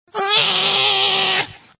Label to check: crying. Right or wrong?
Right